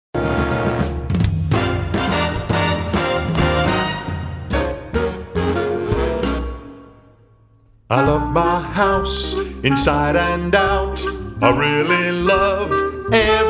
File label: singing commercial; Batibouw